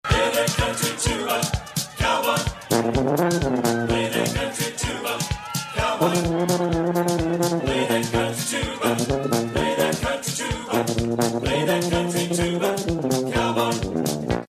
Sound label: Tuba Wiggle Song